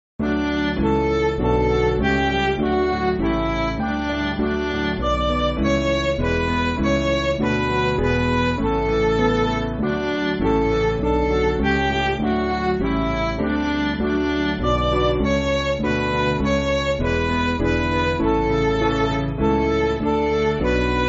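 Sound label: Midi, Music Backing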